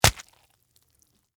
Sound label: Stab Watermelon, Texture, Stab, Watermelon, Wet, Hit, Fruit; Food (Sound Effects:Household); BlastWave